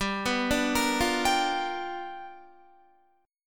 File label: G 7th Sharp 9th G, Guitar; G7#9 arpeggiated; JGuitar